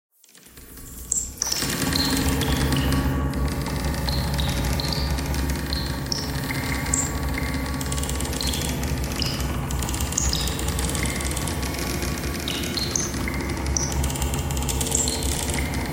bagpipe thread noise; reverb

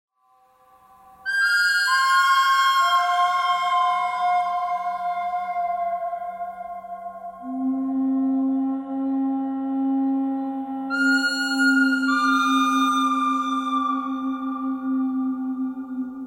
bird cry thread

